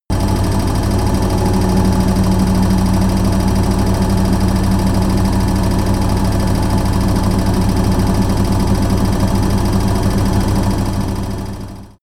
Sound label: washing machine running